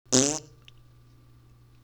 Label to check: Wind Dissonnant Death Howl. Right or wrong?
Wrong